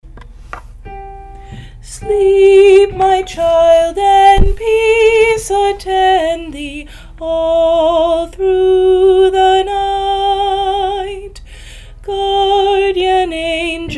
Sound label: all through the night duet top part, choir